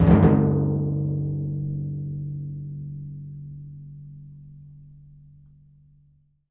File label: TMP, Synclavier Percussion